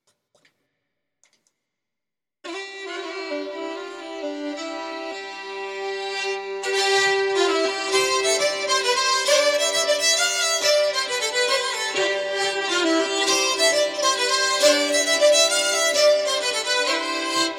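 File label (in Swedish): Polska, säckpipa; efter Einar Frykberg; Blå slussen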